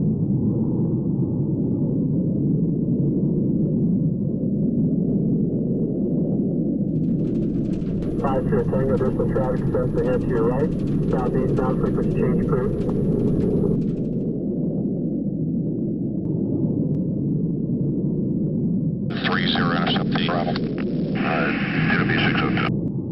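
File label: f117 ambient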